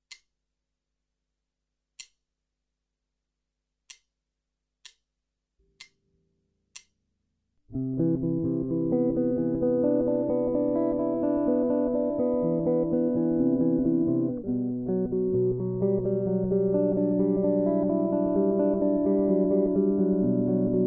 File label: guitar fingerpicking exercises jazz; fingerstyle tutorial